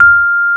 Electric Pianos; Wizoo

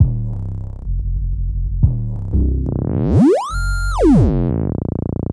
Bad Bass, Drum & Bass; Fluid Dynamics

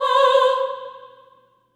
Staccato F C5 L, Symphony of Voices; Spectrasonics

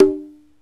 CONGA SET; OMI - Universe of Sounds

